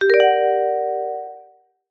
fantasy magic wand ping retro style; zapsplat

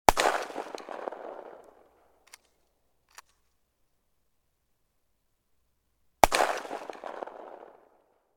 gun model 12; still north media

